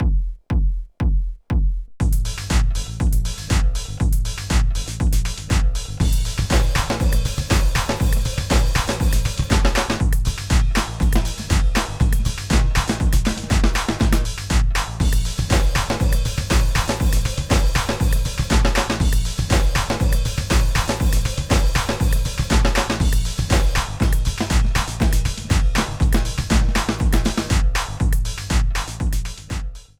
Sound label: Percuss Style